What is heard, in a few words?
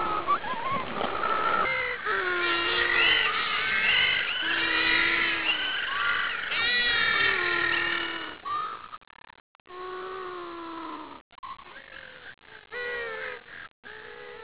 Chukarandos Growl squawk